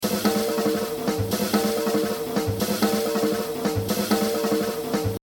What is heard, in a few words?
Exercise, jazz drumming; The Evolution of Jazz Drumming (Gottlieb)